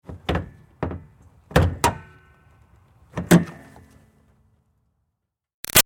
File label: Car Highlander Hood Handling Open; 2005 Toyota Highlander V6; Cars; Vehicles